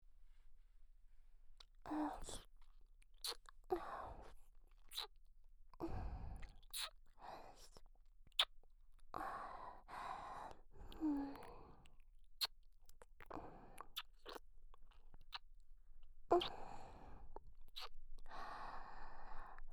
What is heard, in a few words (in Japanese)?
左右交互耳舐め